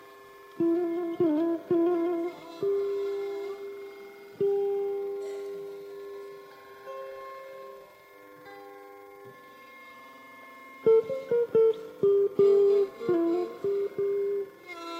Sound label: Kambhoji topic phrase P, ālāpana; topic phrases for P in Kambhōji